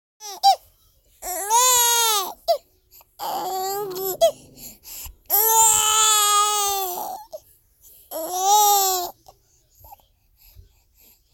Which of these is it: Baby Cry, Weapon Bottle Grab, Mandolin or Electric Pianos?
Baby Cry